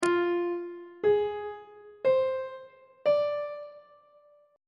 e sharp minor 6th chord intervals on treble clef

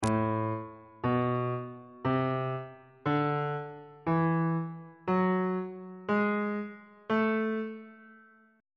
a melodic minor scale note positions on bass clef

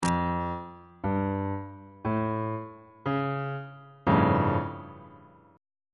a augmented 7th 2nd inversion; bass clef

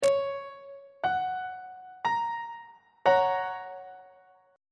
f sharp major 2nd inversion; on treble clef

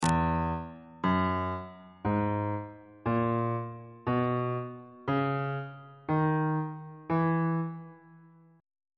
f flat lydian mode note positions on bass clef

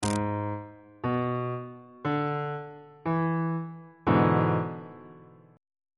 f flat dominant 7th 1st inversion on bass clef

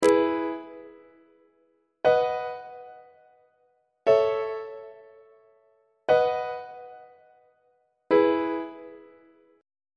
e major plagal cadence on treble clef

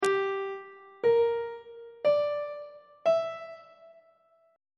g minor 6th chord intervals on treble clef

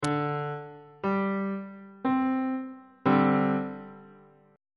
f double sharp suspended 4th 2nd inversion

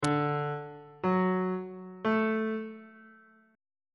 c double sharp major triad chord intervals

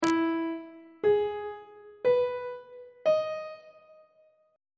e major 7th chord intervals on treble clef

